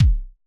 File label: hip hop kit kick